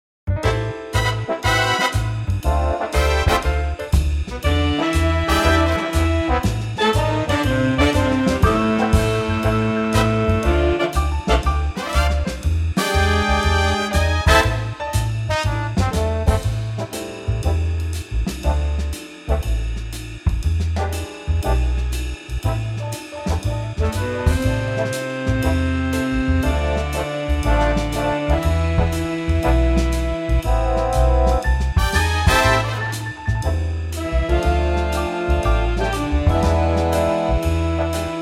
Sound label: Am big band; key - Am; vocal range - E to G (optional A); Super Big Band swing arrangement